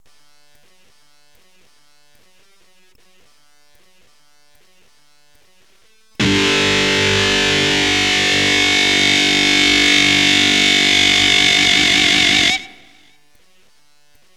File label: E GTR POWER CHORD